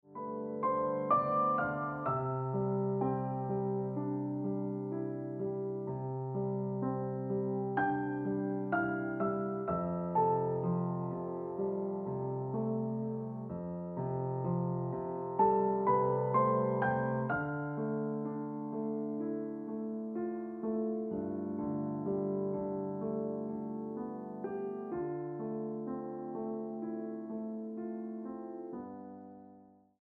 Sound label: Ringtone Piano Music